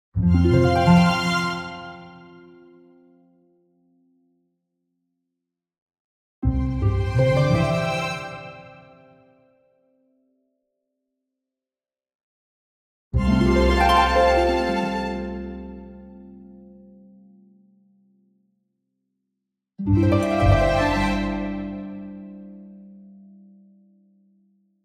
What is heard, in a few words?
Orchestral Jingle Positive; boom library; The Interface